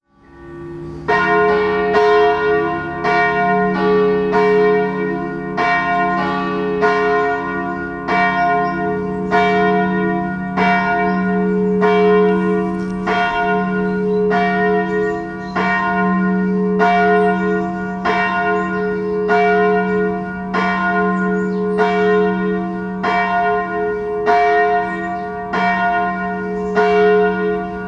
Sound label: Church Bells in Bled in Croatia